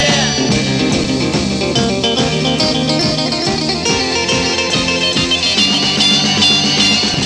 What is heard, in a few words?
guitar